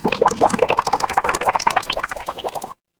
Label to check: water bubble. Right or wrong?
Right